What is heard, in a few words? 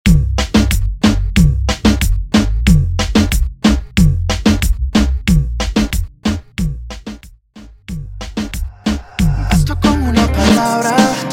Intro Outro Redrum 92 BPM